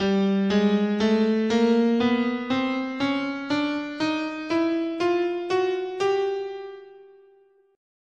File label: G Chromatic, scale